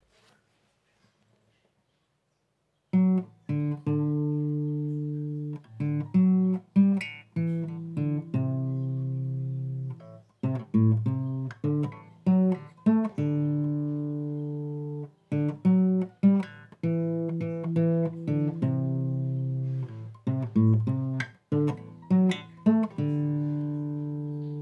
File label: Guitar Attempts